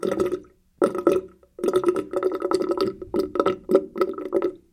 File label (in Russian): voda spuskanie zvonkij